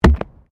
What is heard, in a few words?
PLASTIC RUBBER PIPE; Material components for constructing more complex sounds; Plastic (Sound Effects:Materials)